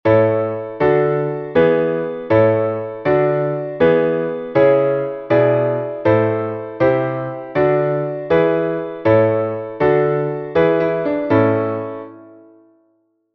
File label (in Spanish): entonacion Piano; Entoación con acompañamento